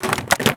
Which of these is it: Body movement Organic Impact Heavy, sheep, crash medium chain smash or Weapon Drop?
Weapon Drop